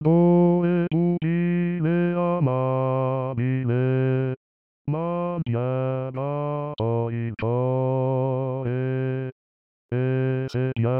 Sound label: bas; Mozart; KV 439; Due pupille